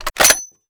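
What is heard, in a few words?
sniper ads rechamber; gmod; iw8; alpha50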